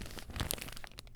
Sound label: Crumple Thick Plastic; BB Retail Therapy Sample Pack